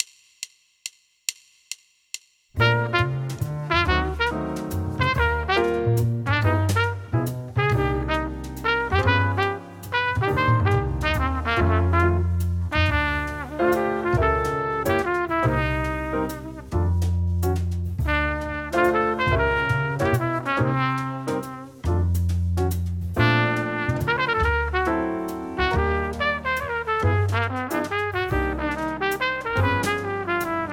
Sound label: Jazz Etude; Jazz Etude #8 Trumpet and Track